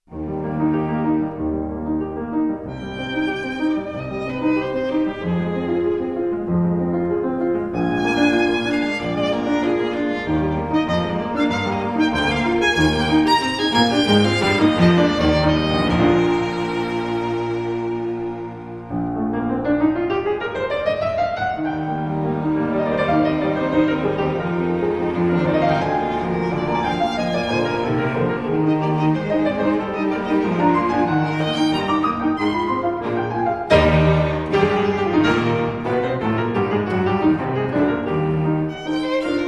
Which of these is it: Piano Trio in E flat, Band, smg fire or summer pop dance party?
Piano Trio in E flat